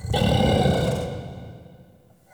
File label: Interior Light Burps; Ambience; Indoor; Warframe; Infested Eris